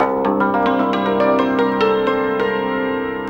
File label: ZIMB ARPEGGI; Best Service; World Colours